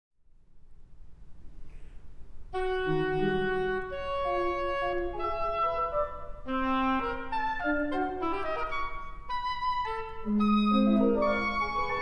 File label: Variations for Oboe and Organ Scherzo; Daniel Pinkham